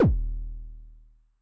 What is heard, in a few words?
BD MOD [Pitch+Depth], 909mod, BASS DRUM